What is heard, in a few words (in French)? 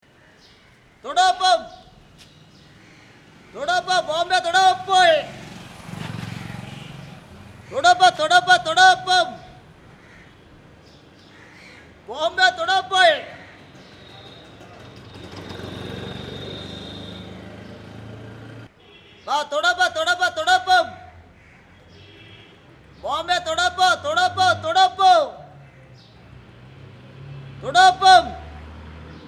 balais